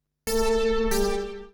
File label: synth tekno 140 A L; Techno2; Keys